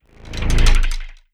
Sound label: Vibes F; Tones